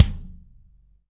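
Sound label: BD DRY, bass drum; Real Mega Drums; Best Service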